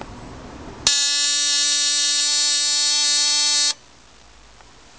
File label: buzz, relay